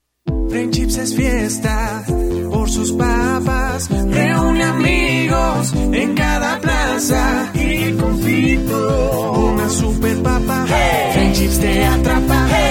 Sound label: JINGLES